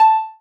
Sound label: marimba rainbow; ゆめ2っき